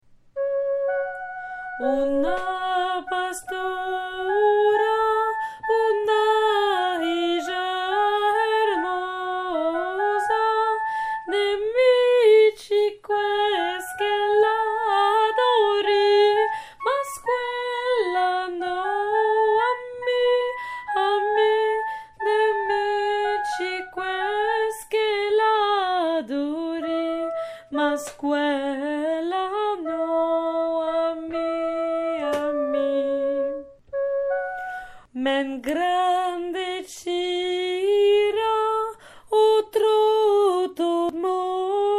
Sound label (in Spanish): mezzo